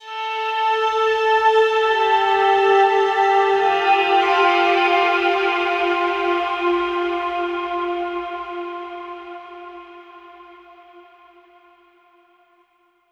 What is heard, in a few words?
Overlay Tone